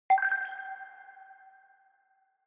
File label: Short Accent Reverb; ショートアクセント; リバーブ